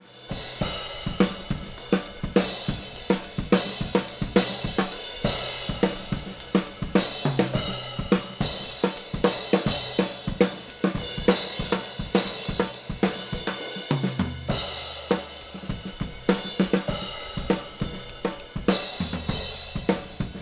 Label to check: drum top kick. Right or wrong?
Right